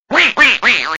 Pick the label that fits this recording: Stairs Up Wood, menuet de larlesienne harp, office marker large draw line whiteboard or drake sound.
drake sound